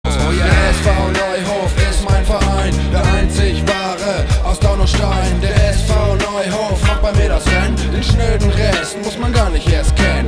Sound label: ringtone